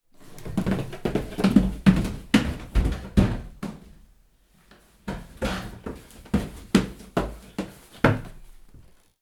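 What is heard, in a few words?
Stairs Running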